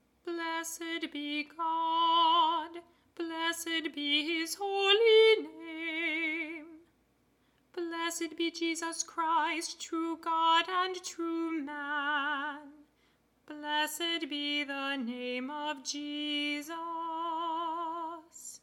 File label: Divine Praises Alto